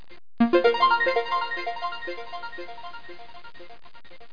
Oodley; SpreadBase

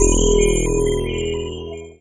hacking pass effect part; Earth and Beyond; EA Games

